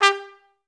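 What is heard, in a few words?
staccato G3; Best Service; Peter Siedlaczek's Advanced Orchestra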